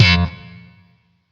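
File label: Shawzin slap F, octave two; Warframe; Sentient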